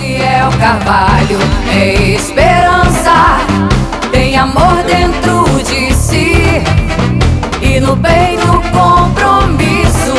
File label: Jingle